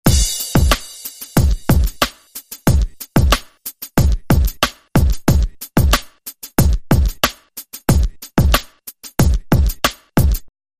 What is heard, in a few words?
Drum Loop